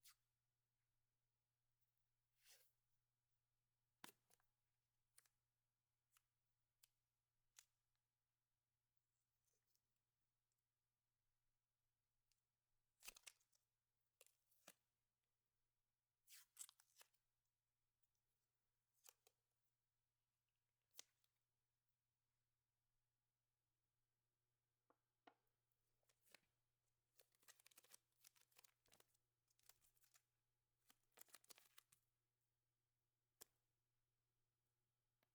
Plastic Tape; Soundmined

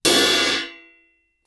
crash choke crash, Suspended Cymbals; Percussion

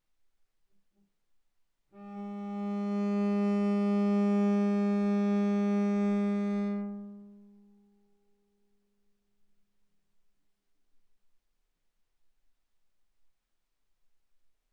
Viola arco sul A mf A4 B4 stereo

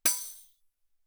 tambourine normal ff; Percussion

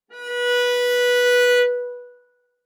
Viola arco ff sul A B4 stereo; MIS Pitches - 2014; Strings

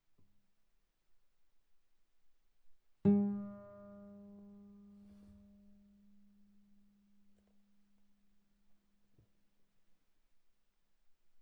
Viola pizz sul A mf A4 B4 stereo

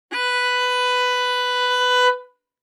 Violin arco ff sul A B4; MIS Pitches - 2014; Strings

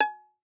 Violin pizz ff sul E Bb5; MIS Pitches - 2014; Strings

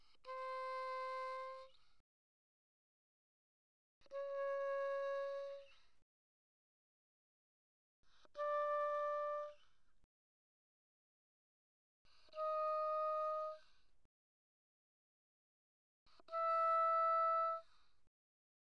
Oboe pp C5 B5, woodwinds

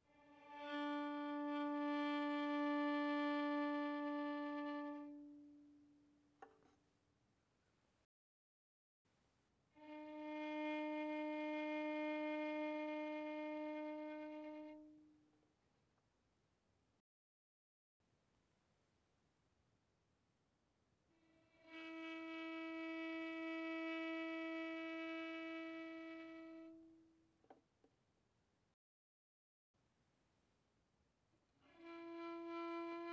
Violin arco pp sul D D4 Bb4

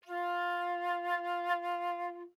Flute vib ff F4 stereo; Woodwinds

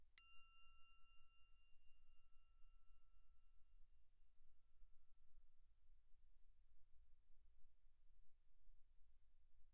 crotale E6 pp; Percussion; crotales

